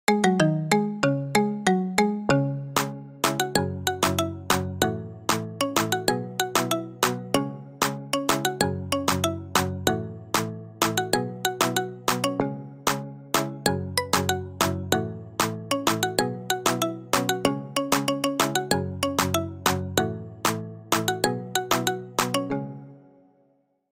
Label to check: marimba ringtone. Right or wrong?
Right